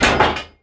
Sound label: window bars metal deploy; Rust